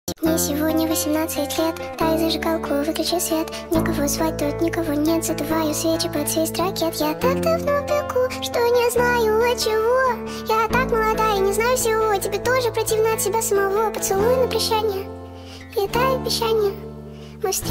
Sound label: acoustic speed up